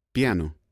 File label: musical instruments